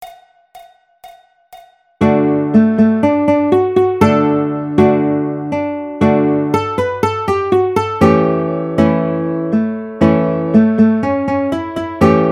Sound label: La Cucaracha Baritone, ukulele; Jolly Roger Ukulele Book 1